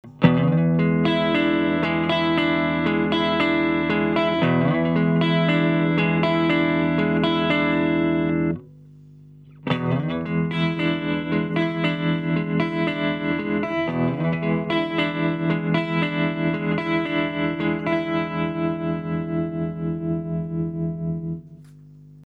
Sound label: Tremolo, Effets